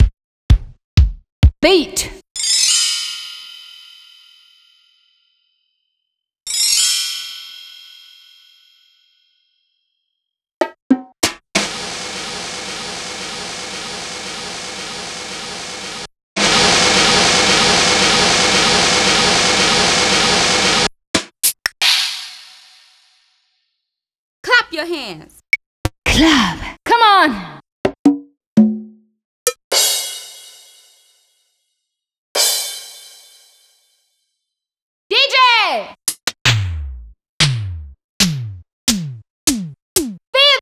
Yamaha DJX-2B1

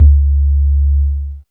Sub, drum

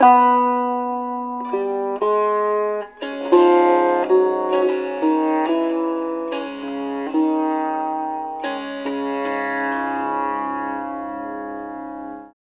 SITAR; Spectrasonics; Heart of Asia

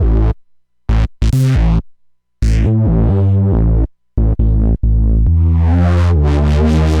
bass loop, drum n bass

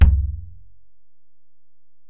Ac BD, Alesis DM-PRO; 300 Drum Machines

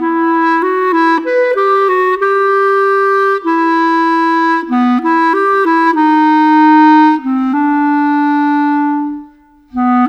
Clarinet; Sonic Foundry (Sony Creative Software); Orchestral Series VOL-4 - Rock and Pop

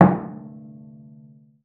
CELLO FINGER; 90 s Sample CDs; Xsample VOL-5

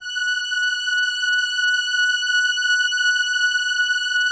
LAMBDA, String Machines

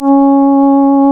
REED C#; Big Fish Audio; Synclav FM

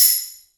Jomox Xbase888

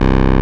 SYN TB, Roland MC-202; TB303+MC202; Sound & Vision - Gigapack I CD 2

